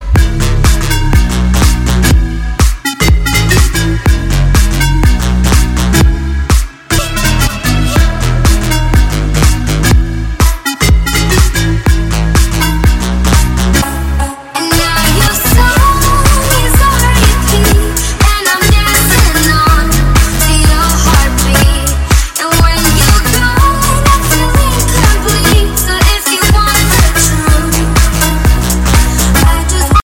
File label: ringtone nokia